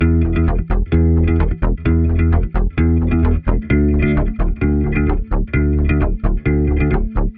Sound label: E Bass Rubber Slug; Zero-G; Future Wave